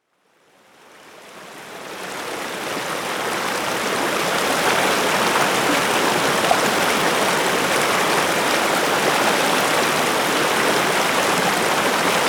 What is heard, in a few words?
river with rapids